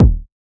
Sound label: kick drum one shot d sharp key